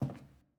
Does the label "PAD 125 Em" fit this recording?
No